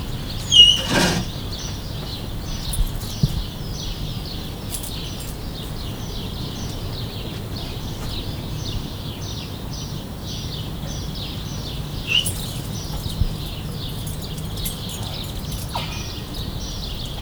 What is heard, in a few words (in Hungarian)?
három másfélé jel, szurikáta; debreceni zoo 2012; marantz puska